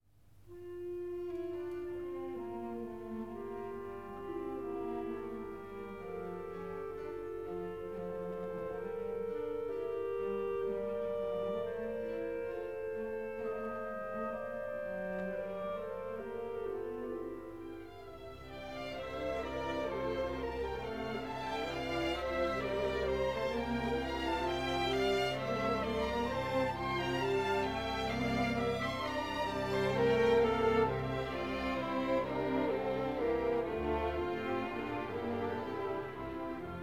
Requiem in D Minor, K 626 VI. Sequentia Recordare, Jesu pie; Mozart, Wolfgang Amadeus